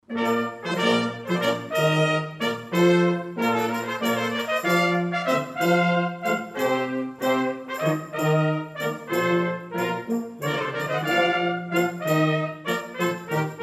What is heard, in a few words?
Royal Fanfare; Henry VIII, arr. B. Wüthrich